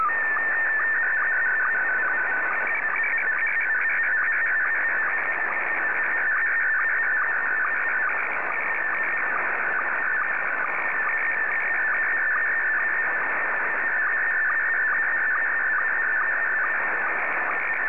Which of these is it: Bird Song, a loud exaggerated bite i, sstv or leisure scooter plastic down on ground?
sstv